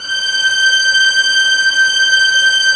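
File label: Vio legato G5; Digital Sound Factory Virtuoso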